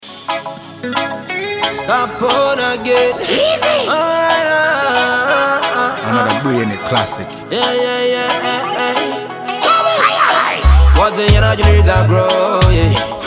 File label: Riddim